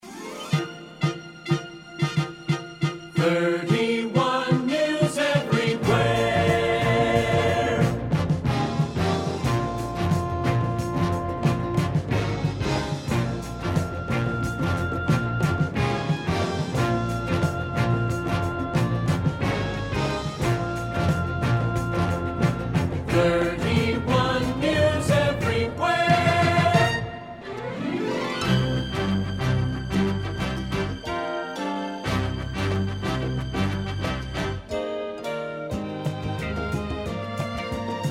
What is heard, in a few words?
hardbeat